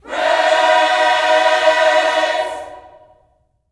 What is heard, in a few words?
PRAISE, Vocal Planet Gospel